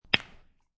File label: Knuckle Crack; One knuckle cracks its way toward arthritis